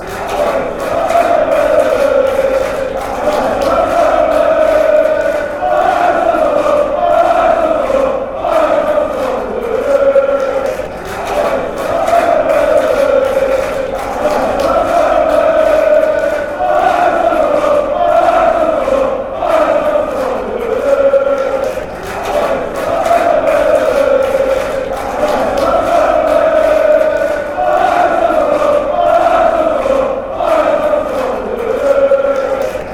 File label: ajax amsterdam ole ole, fanchants